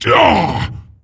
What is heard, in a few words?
Wound Light; Jaraxxus